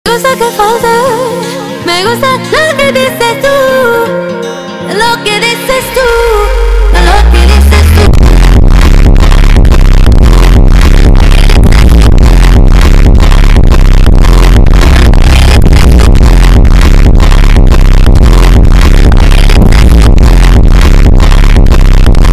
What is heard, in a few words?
Phonk superbass